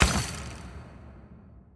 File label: button Spin; netent; wishmasteroct